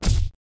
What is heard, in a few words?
blow, battle